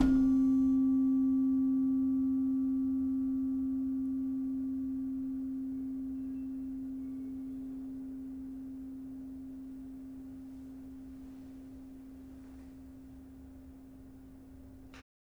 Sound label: Slentem, gamelan; gravityharps; timbrebox